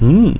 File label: "hmm"